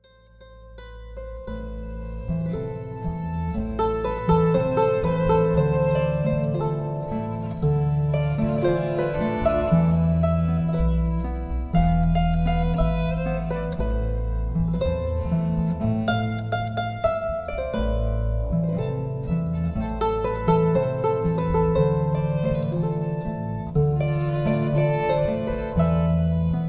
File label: Habanera; Gris